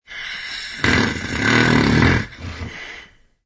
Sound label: Snore